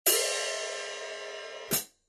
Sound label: 14in Rock HiHats, cymbal